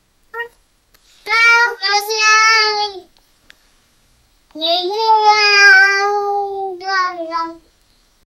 amazement

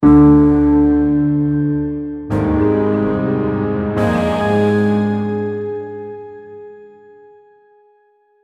suspenseful music

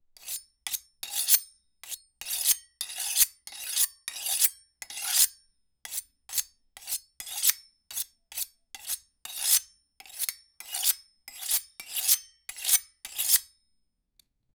sharping knifes; everyday sounds

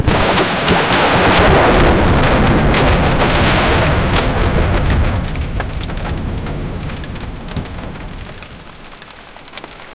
very loud thunderstorm; wood cracking

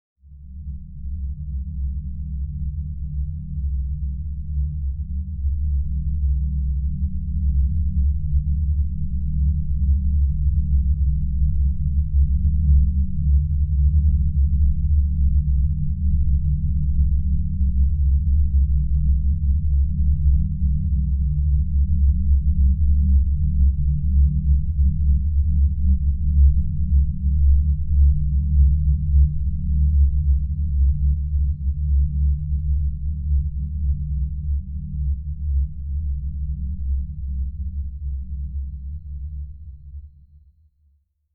sci fi ambiance layer war

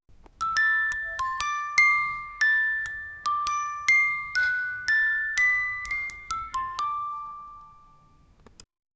the ticking is now slight, music box

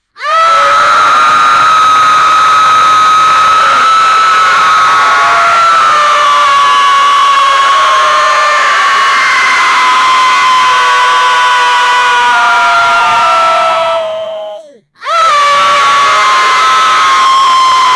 goblins screaming

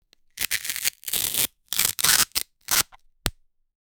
cigarette pack opening, cardboard

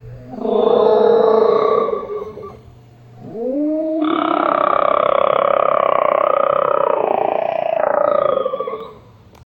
giant crocodile amphibian, reptile

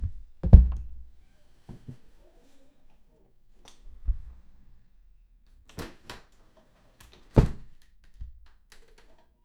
chest

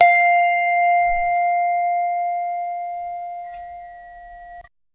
a clean dry audio recordi, handpan

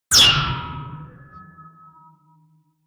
impact sound of a laser, asteroid

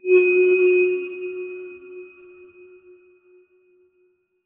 ethereal spirit shot sound

